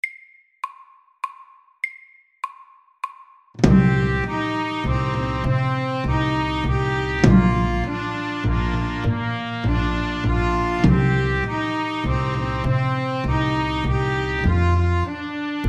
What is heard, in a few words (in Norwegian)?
Sjanti SLOW 100